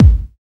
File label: BD, Dance Kit, Roland; 90 s Sample CDs; Sound & Vision - Gigapack I CD 1; Dance-Kit 27